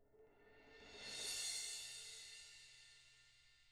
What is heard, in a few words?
swell; ILIO; Double Platinum Drums 1